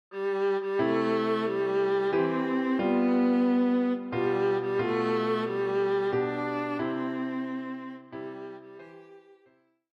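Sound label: viola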